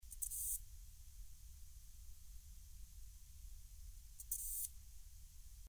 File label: ashiguro tsuyumushi